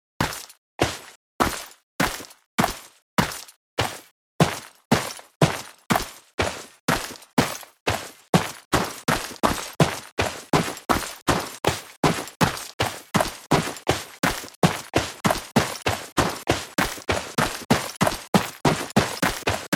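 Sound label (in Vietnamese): âm thanh tiếng quân lính vừa đi vừa chạy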